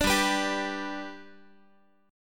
G Major G down Guitar 4A 4E 5A 5D 5G sharp 6C sharp; strummed